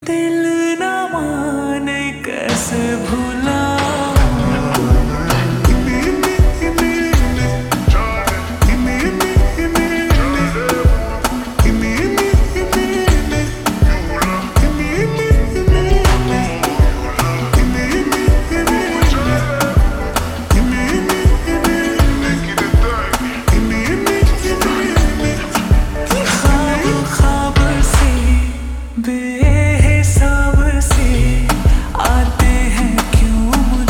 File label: Ringtone Slowed Reverb